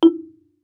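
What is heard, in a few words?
Marimba deadstroke fortissimo E4; MIS Pitches - 2014; Percussion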